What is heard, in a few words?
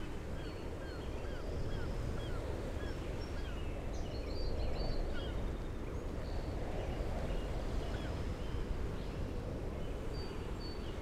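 ambience brekkie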